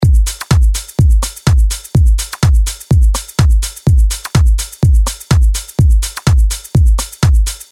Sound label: drums